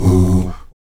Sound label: VOX AHOO, Afro Chants, Stacc Ah Oos